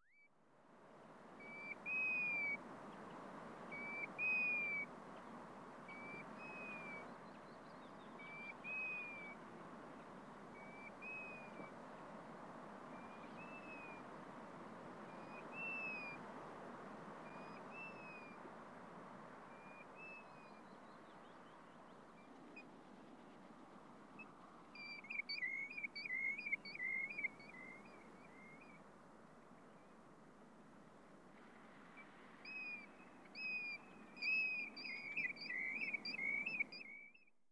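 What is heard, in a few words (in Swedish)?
Ljungpipare, Pluvialis apricaria - Spel av ensam fågel på hög höjd; flock på marken; Swedish Bird Songs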